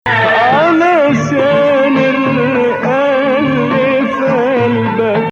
Nawa Athar Gameel Saba

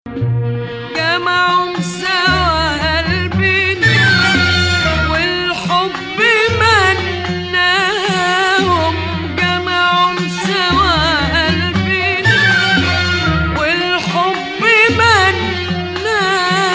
Rast Hijaz

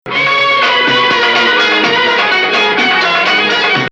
Jiharkah

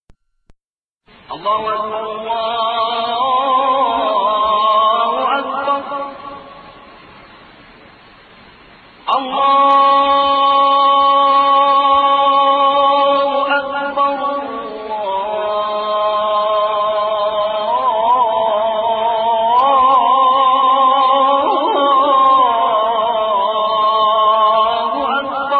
Hijaz Adhan Hijaz

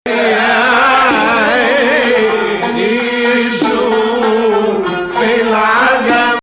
Dalansheen Ijma3 Shufna 2nd Rast

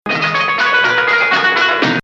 Nahawand - Bayati

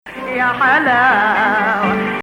Hijaz; Zanjaran

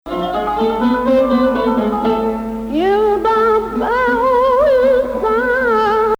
Nahawand7

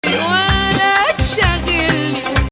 Hijazkar Rast

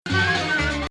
Pseudo Hijaz

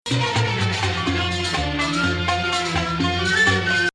Sikah Beladi

